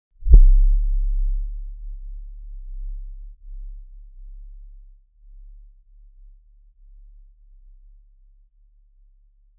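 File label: thump thunk a low soft wood, bump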